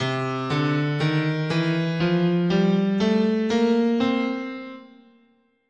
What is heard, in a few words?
C Bebop Minor, scale